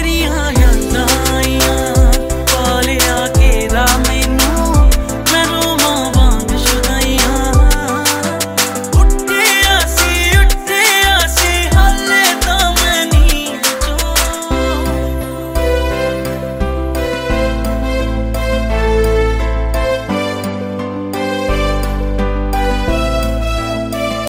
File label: ringtone bollywood ringtones